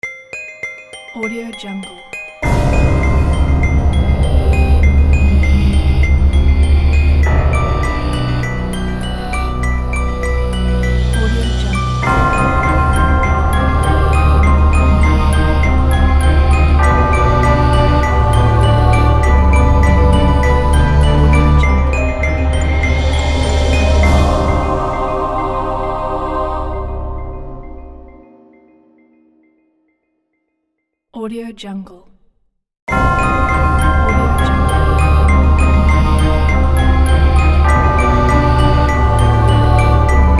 horror ident

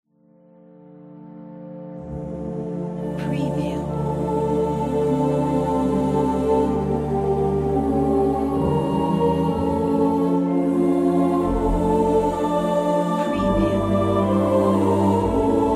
angels choir

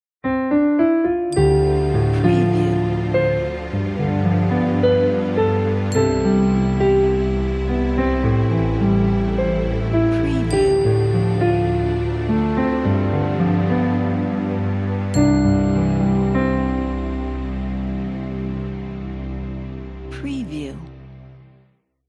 romantic ident

